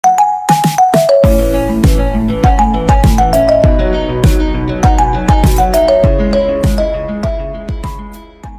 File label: Beat; Klingelton; iPhone